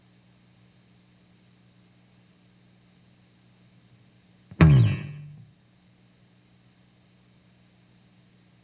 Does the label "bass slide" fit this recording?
Yes